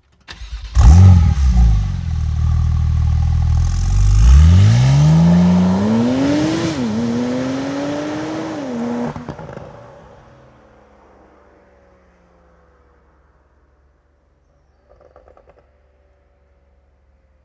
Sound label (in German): Motor starten Vollgas davonfahren Abgasklappe offen